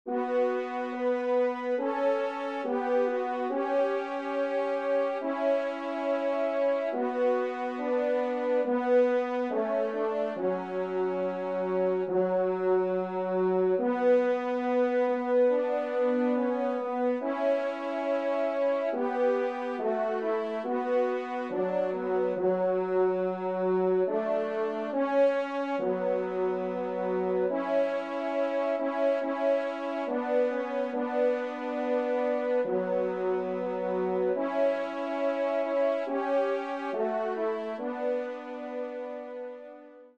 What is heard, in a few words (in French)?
Kyrie, trompe; Devert; 2e Messe Kyrie